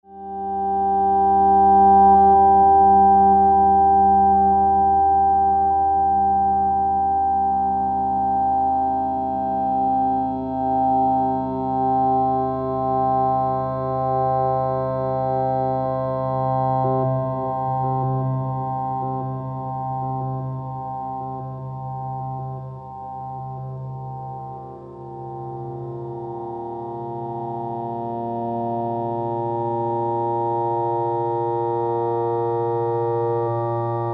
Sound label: Drones Deep